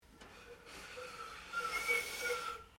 Steamtrain Whistle; Bellarine Tourist railway vehicles; Trains

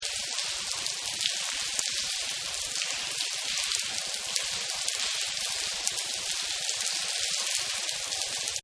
Rain Hard Concrete; Rain Drippy City; Sound Effects:Weather

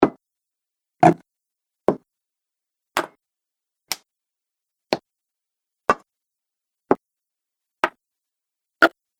10 Sounds - Object Placement, Hit; sound design

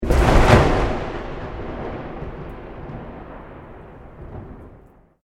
THUNDER CLOSE EXTREME, Severe hail storm

